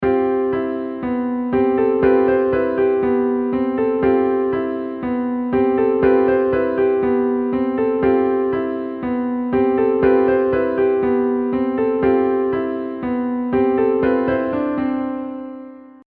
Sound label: Piano; Musical Sound Effects